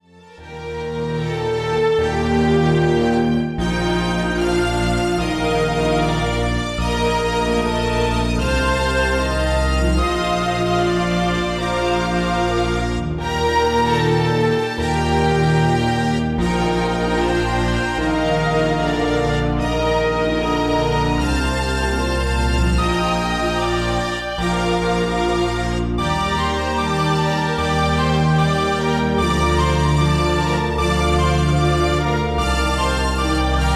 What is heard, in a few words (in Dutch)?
orchestrale compositie; eigen orchestrale compositie